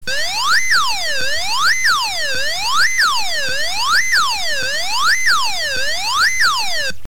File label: Sirene; Amerikanische Polizei-Sirene